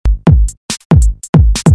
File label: Loop